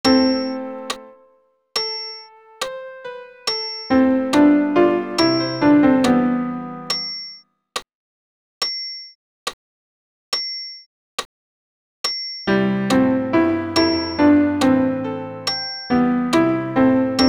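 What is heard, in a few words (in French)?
Ite missa est ténor piano accompagnement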